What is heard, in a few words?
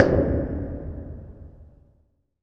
WATER TANK, Impacts, Hits & Booms